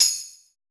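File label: jack tambourine; Drums In Your FACE!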